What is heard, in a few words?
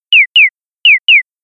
like chick; ヒヨコっぽい; animals